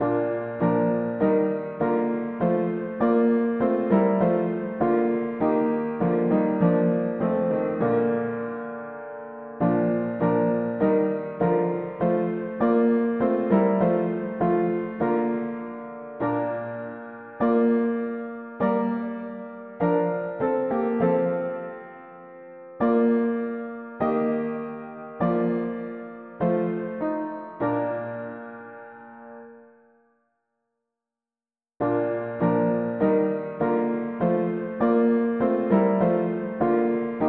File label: mono piano; hymns; Let saints on earth their anthems raise